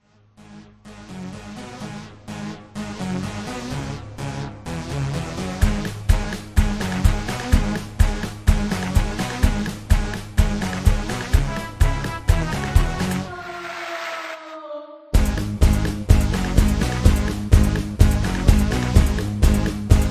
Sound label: Patter